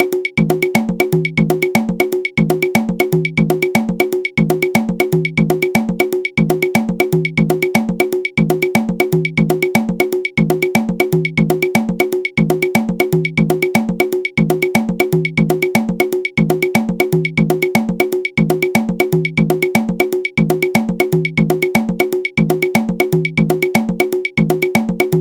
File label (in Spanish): makuta